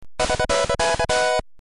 Bonus Sound Effect; video games; Balloon Fight

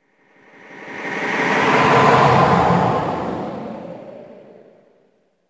Ghost Whisper; holiday and festive; halloween

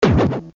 Enter Castle; video games; New Super Mario Bros

